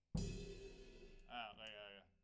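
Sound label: Snare Top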